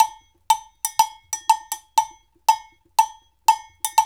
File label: 120 Songa, African Contemporary; Spectrasonics; Supreme Beats; Songa Ltn